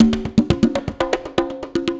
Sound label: 120 BONGO; Best Service Advanced Media Trax Modern Composer